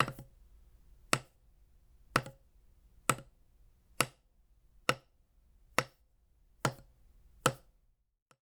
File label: hammer hammering construction